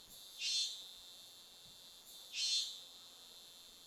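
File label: Ambience Hong-Kong Insect-Cricket Loop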